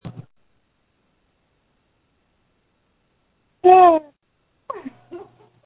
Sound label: screams